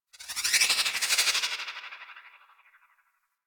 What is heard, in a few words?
Freeze Ray Laser One Off; Warframe; Weapons; Corpus